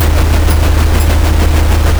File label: car engine idle loop; vehicles